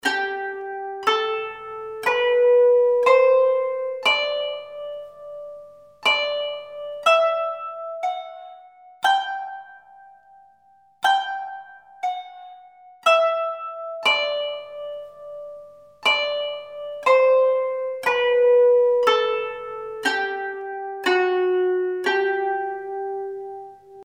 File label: kanun rast